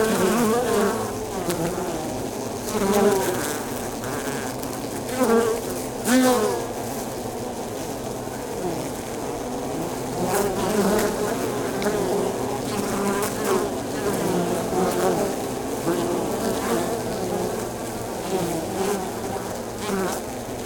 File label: bees hive exterior landing zone very close stereo; SoundHolder; Beehive